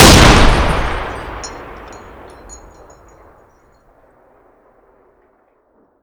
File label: weapon btr; STALKER; IWP